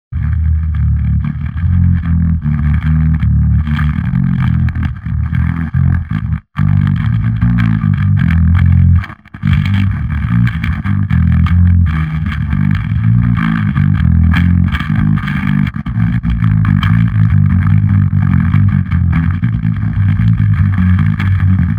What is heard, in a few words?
bass